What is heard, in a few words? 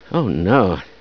startle, scientist; cstrike